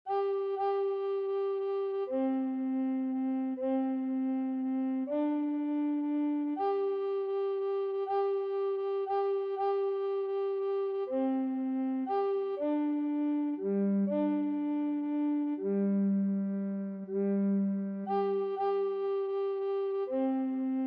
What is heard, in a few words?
Bass Recorder; Earl of Essex Measure